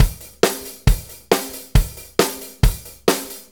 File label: 4-4 Loop, Drum Loops, Full Kit Loops; Sonic Foundry (Sony Creative Software); Loops & Samples Drum Tools